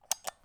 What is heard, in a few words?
switch, UI Audio; Kenney's Sound Pack